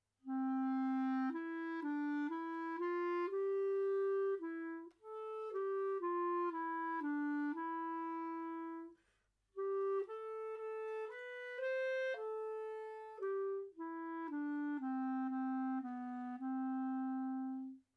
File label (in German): Lied Klarinette